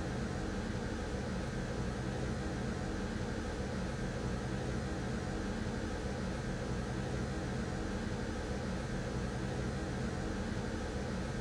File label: light machine vent; teardown ps5 windows xbox series xs gamerip 2022